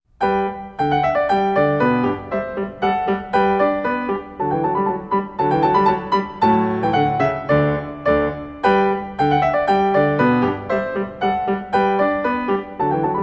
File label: Country Dance In G Major; Magrath Book 04